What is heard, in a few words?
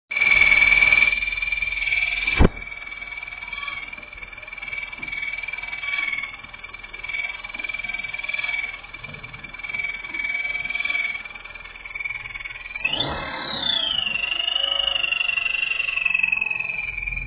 panda noise, chirp noise from engine